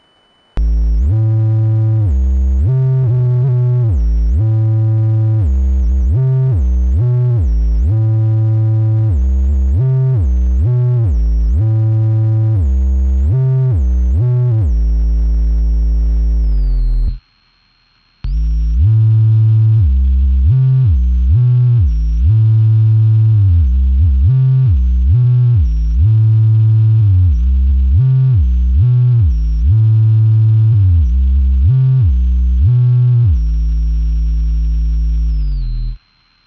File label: octavius squeezer tri, bass